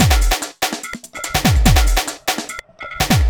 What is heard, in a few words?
cracker 145